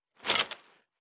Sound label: warrior walk foley